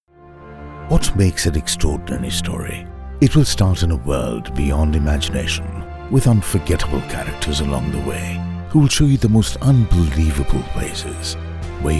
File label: Groove Flugelhorn; Kumasi